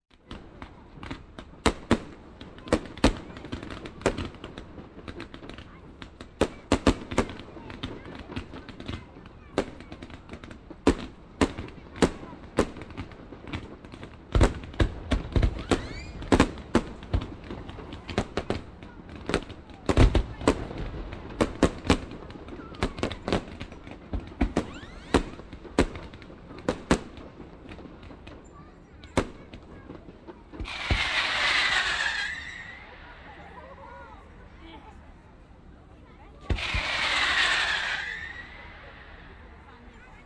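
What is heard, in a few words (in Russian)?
всевозможные запуски и взрывы; звук салюта или звук фейерверка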